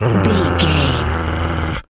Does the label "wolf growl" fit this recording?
Yes